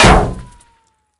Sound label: metall, bullet collide